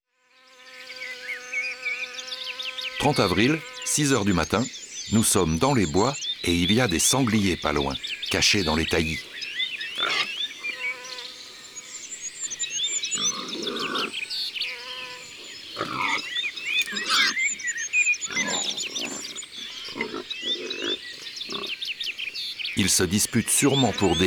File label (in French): Sanglier; ABC de la nature